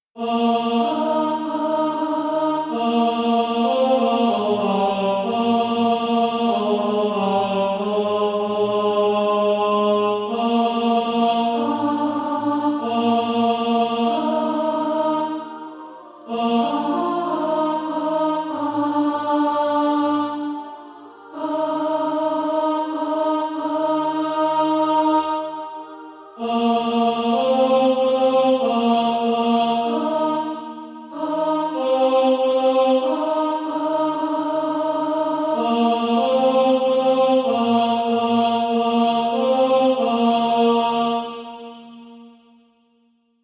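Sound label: tenor, Practise Music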